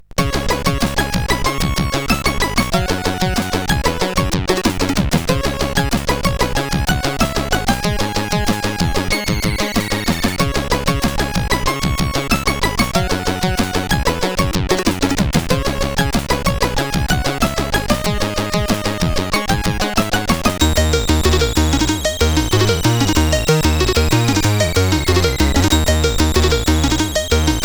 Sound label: Hip Hop, CSG8580R5